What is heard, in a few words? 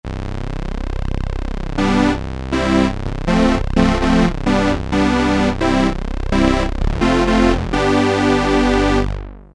Brass 1 - Jump Synth Brass, Crumar Trilogy